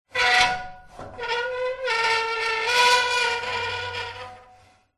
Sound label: industrial ladder metal slide movement screech metal